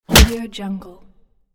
punch

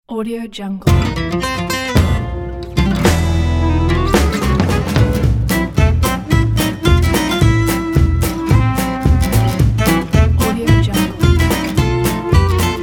cooking

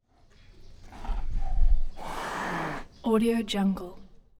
tiger hiss; prosoundfx

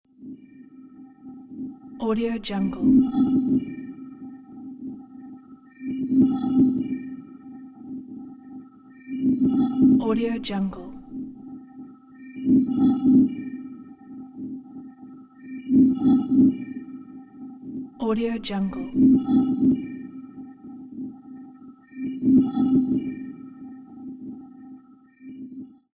distorted pulse sound; flytrix

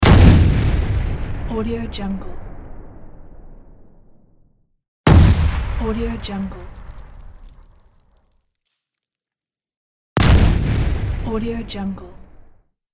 explosion

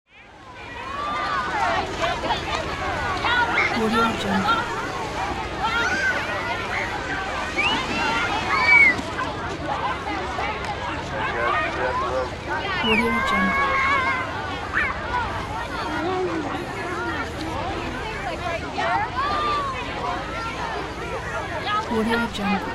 beach ambience

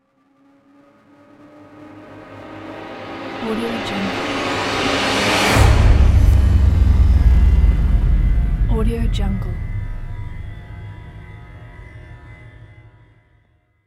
dramatic thriller rise and hit

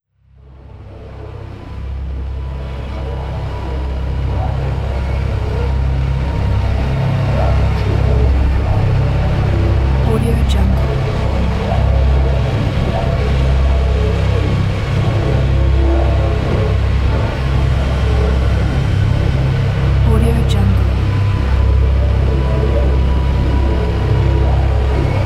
dark aggressive soundscape

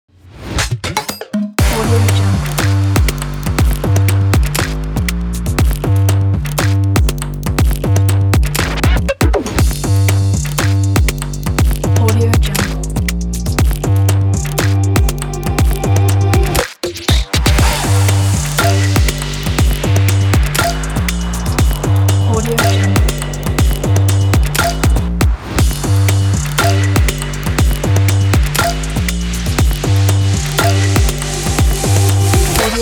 trap bass

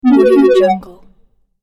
transform

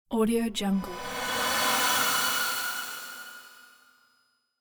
massive cinematic horror scream down

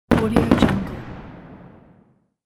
firework deploy and explode

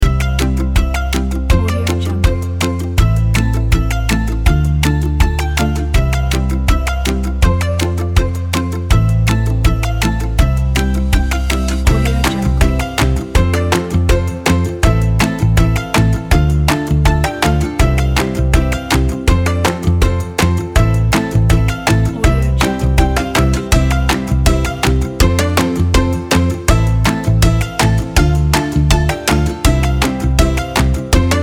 happy and positive children

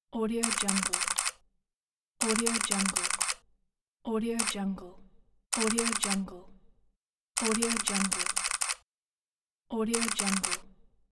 camera shutter; mattpear

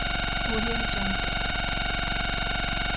scifi production line machinery loop

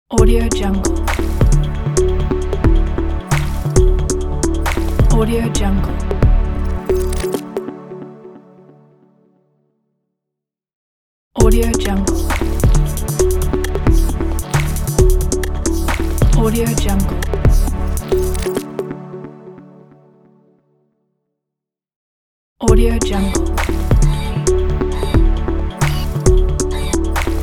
elegant modern clean logo

